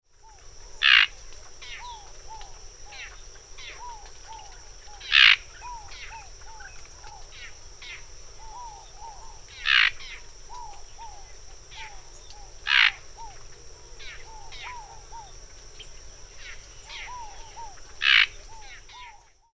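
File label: sounds of icterid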